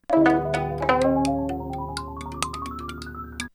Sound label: musical gesture; Sequence of Earlier Heaven